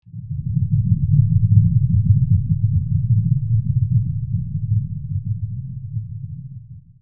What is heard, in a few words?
cinematic rumble